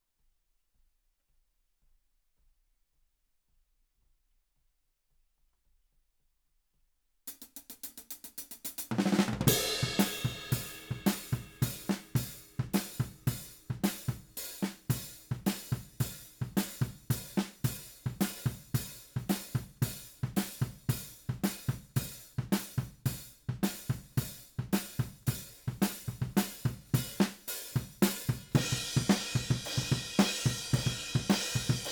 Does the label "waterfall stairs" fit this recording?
No